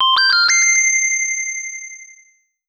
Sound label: Jingle Quest Accepted!